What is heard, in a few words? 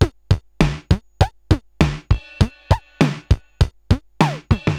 Moog Freqbox RX17 (100BPM), cyborg beats; musicradar